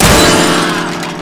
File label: Piano; asdfmovie